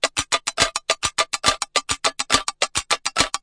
triplet clickety clack, drum loops and breaks, 140 bpm